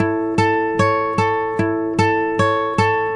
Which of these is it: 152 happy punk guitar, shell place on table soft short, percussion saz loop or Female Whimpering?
152 happy punk guitar